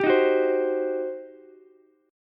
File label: G Major 7th Suspended 4th Sharp 5th, G, down, Ukulele; GM7sus4#5 strummed